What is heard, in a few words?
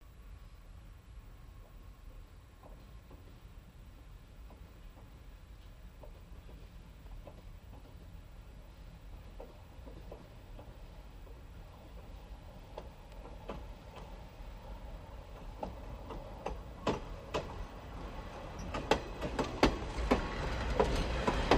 Diesel Train Backs Up, Locomotive and 3 Cars